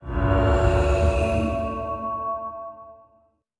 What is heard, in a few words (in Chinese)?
信标充能声